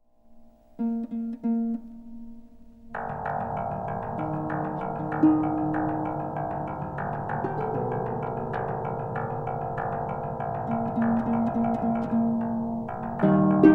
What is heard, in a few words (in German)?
Harfe